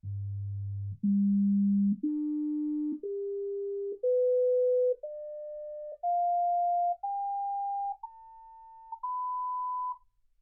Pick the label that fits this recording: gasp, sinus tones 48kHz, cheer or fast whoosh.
sinus tones 48kHz